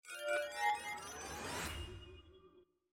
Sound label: near Win; netent